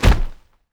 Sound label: bag damage; Counter Strike Model Pack; CS:GO; survival